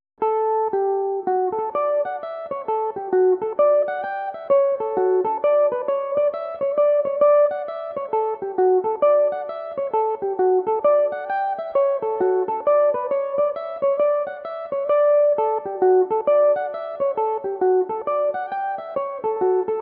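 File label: hornpipe D major, Irish mandolin; Tobin's Resource; Belmuse EM-190 electric mandolin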